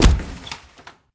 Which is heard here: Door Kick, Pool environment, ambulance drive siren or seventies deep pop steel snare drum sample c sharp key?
Door Kick